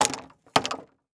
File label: Sports Club Golf Club Dropping Into Golf Bag Double